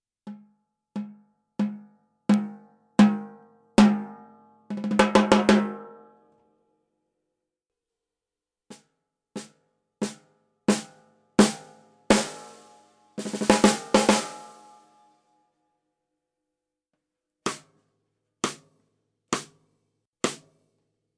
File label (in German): Drums einzeln hoch